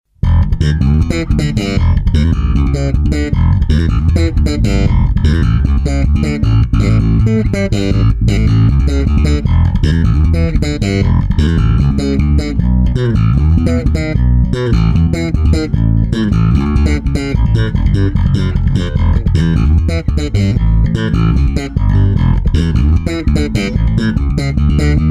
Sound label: punkfunk; Punkfunk Slap (beide PUs)